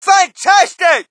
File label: crowd, shout; Mike Tyson Heavyweight Boxing